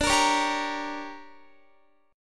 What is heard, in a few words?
D Major 7th Suspended 4th Sharp 5th, D, down, Guitar; strummed; 4E,4A,5D,5G,5C,6F; chord